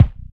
old school original kick single shot g sharp key

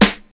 00s sizzly snare drum sample g sharp key

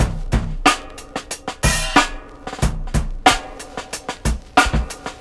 92 bpm drum loop sample c sharp key

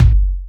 phat kick sound g key

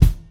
smooth bottom end steel kick drum E key

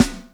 fluffy snare drum sound b key; snare drum samples

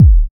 rich low end kick drum single shot e key

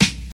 crisp snare b key; drum single hits one shots; snare drum samples

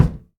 tight low end kickdrum sample b key

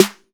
piercing snare drum f sharp key

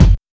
clean kick single hit g key

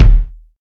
reverb kick drum d sharp key

